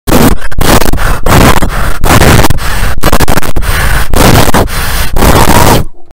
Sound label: earrape